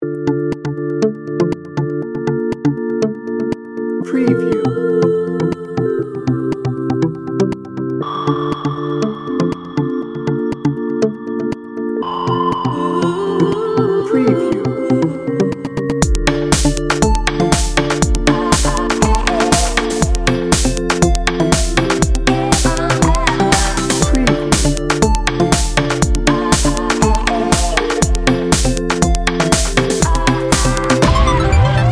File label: dance it out